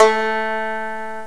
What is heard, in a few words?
banjo; GMPlay; Ethnic